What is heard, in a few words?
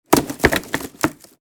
Hamper drop floor impact; basket